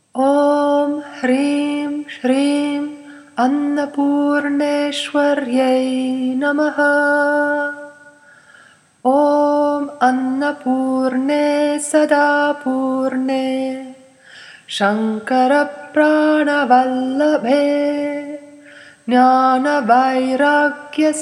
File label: Prayer before eating Chanting; Annapurne; Sharada